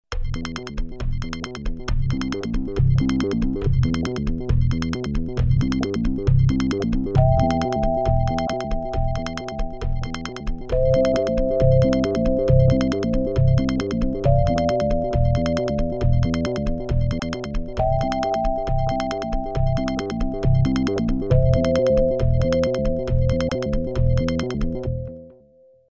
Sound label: synth, Alesis qsr synth